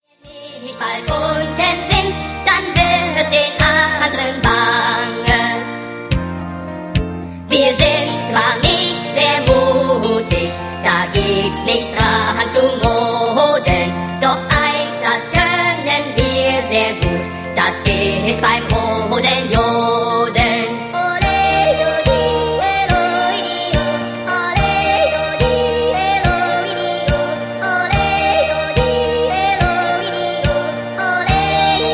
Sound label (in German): Lied 'Murmeltierjodler'